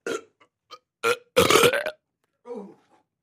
Belch A Little Surp; belching

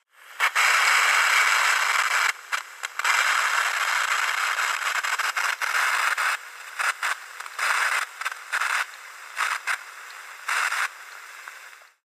Radio Static Broken, static

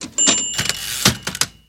Typewriter Manual; typewriters

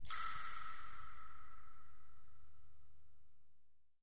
Echoey Cave Drips Wa

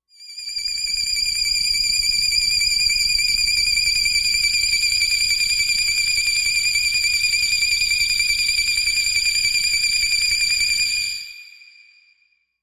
Screw Twister Machine; scanners

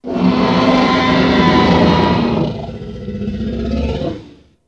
Dinosaur Roar Scary

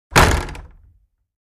Door Hit With Rattle

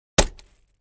Axe Paneling 1 Side, axe hits

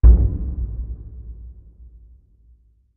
Bass Impact Low Drum; impacts

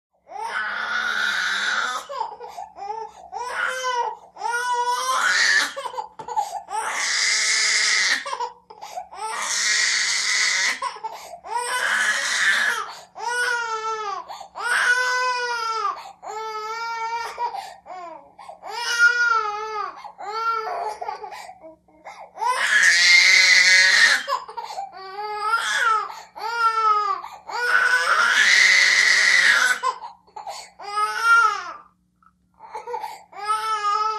Baby Girl Cries Bath, twelve month old

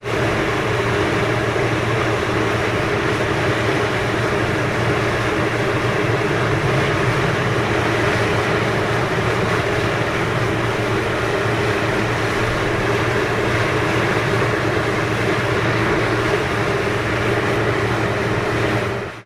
fan large, industrial fans

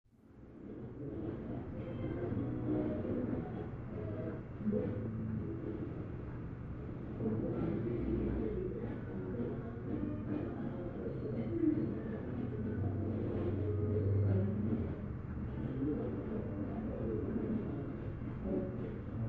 Mx Radio Tuning Stat, static-voices

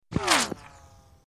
Right To Left, bullet bys

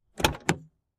SUV gate open, tailgates

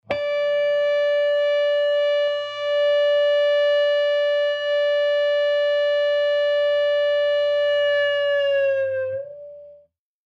Elect Guitar Feedbac, guitars

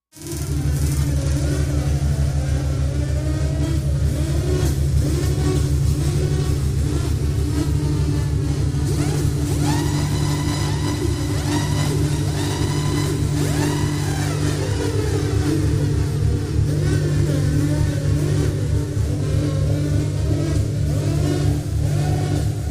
Confusion Drone High Bu, increasing & reverses

